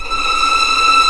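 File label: E6 tremolo p violin left, violins tremolo; East West; The Ultimate String Collection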